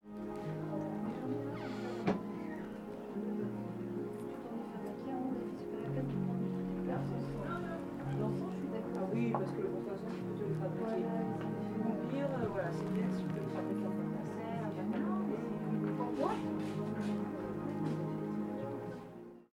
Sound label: Shop Music; Hyeres